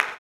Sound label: CLAP, blues & soul; ILIO; Vocal Planet